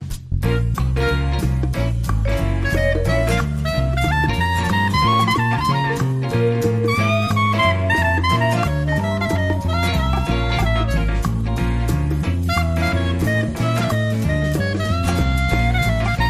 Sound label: Light Clarinet Underscore